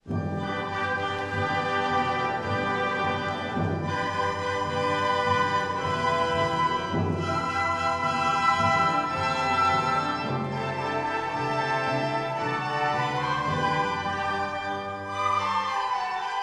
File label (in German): Prelude Rondeau Prelude, floetenchor